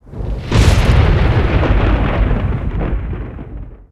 thunder; Counter Strike Model Pack; CS:GO

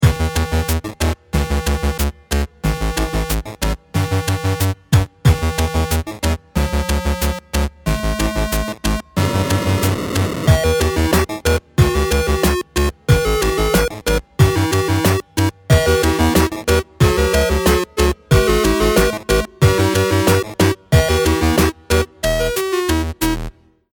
start round, music

